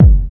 fat kickdrum sample g key, Royality free bass drum single shot tuned to the G note.